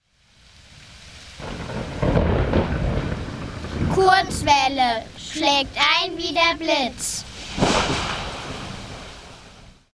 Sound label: Jingle; KW Blitz